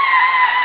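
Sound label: skid tarmac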